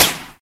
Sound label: Bullet Impact